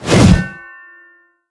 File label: swing metal